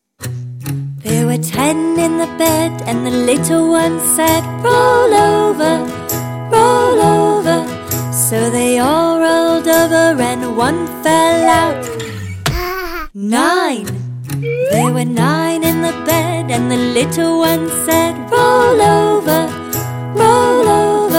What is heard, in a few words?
counting songs